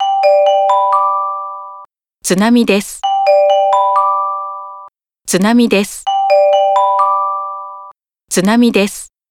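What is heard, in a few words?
tsunami warning, Japan; iPhone; i OS; iOS10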